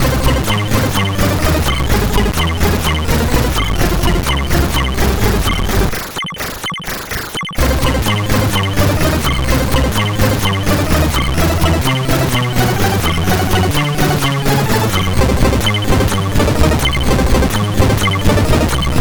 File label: Music Samples, sid, CSG8580R5